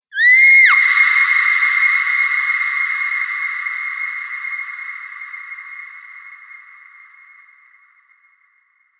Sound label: Scream Cathedral Drone; Drone Sound Effects